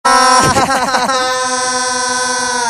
risa

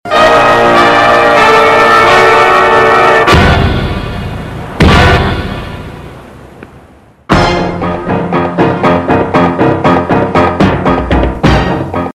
instrumental

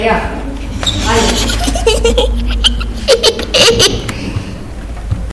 risa

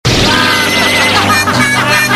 risa; cqc

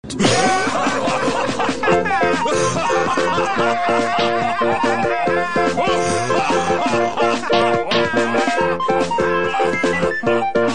musica

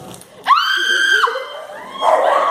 grito

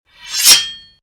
sacar cuchillo

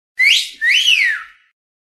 silbido sexy

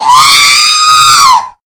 r2d2 grito alto

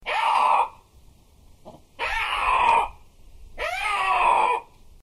lince rugido

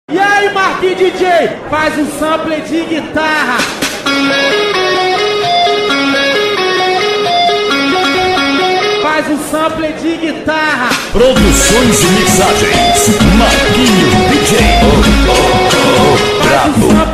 sample de guitarra

